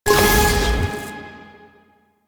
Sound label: Hack Minigame Click After Hold D